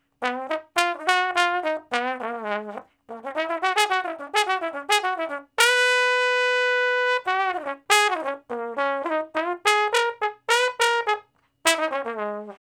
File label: Trombone Slo Blus (Db V, IV, I, V); Crimson Blue and Fabulous Horncraft 4 RnB; Horncraft for R&B; Solos